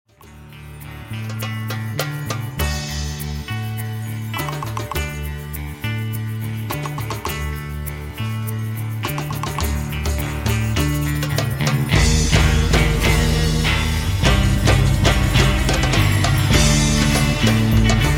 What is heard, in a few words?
Tribal Trance